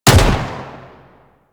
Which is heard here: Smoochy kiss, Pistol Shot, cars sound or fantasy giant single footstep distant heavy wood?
Pistol Shot